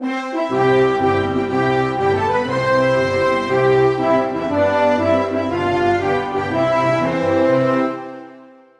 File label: Anthem Mexico; Buzz! The Mega Quiz; PS2